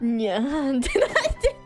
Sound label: "Nyaahaha"; noises